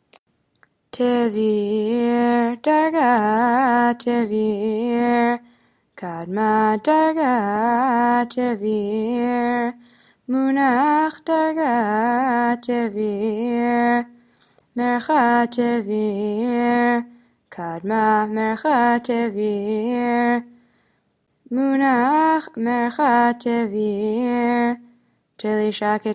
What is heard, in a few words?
polish lithuanian torah תביר